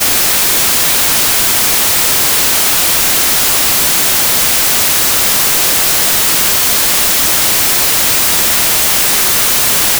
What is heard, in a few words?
white noise